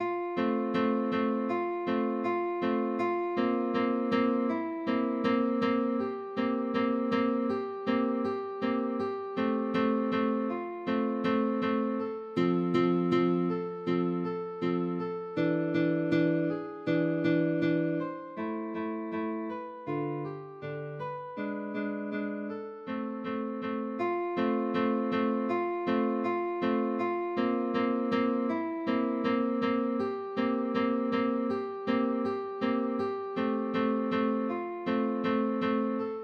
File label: guitar